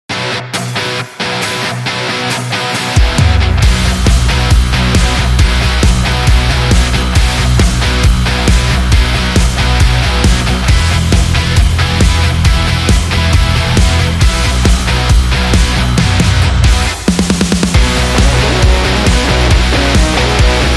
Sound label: Rock and Roll